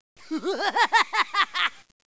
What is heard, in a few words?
Man Laugh